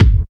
Dubstep Kicks

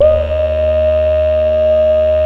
BEGIN LOOP, Synth and Organ

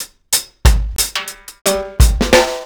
RG90 DRUM, REGGAE; Best Service Advanced Media Trax Modern Composer

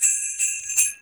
LOOPS

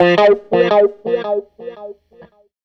Strat Guitar Loop

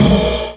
Loop, Chamberlin Rhythmate

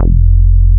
BASS F2 MP; MR.J BASS

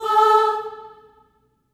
STACCATO A4, Symphony of Voices; Spectrasonics

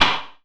SLAPSTICK, percussive; Rarefaction; Poke in The Ear With A Sharp Stick

